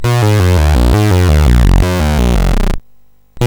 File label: BASIC, CSG8580R5